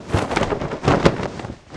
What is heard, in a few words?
Harpy Thunder; Seer; GamigoNA